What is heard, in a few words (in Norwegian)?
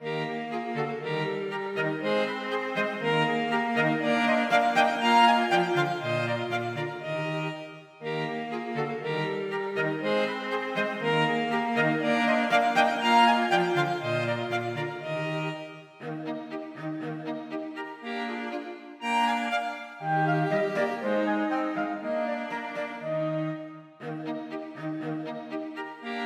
Fejer for strykekvartett og klarinett